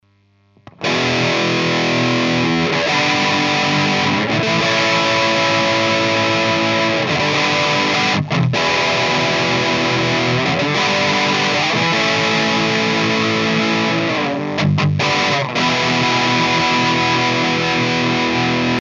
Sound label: Distortion Bridge Humbucker Chords